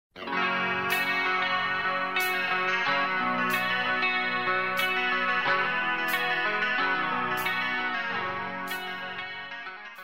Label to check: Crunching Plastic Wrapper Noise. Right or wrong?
Wrong